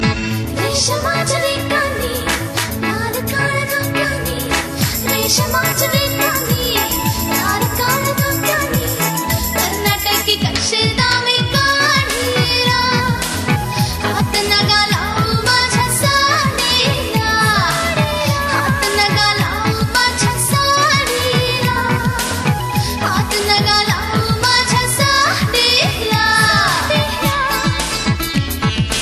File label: marathi